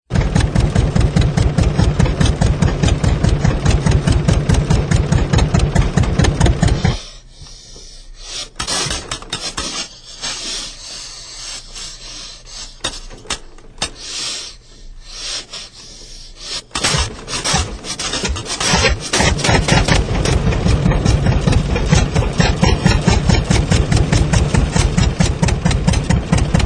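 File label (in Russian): старый трактор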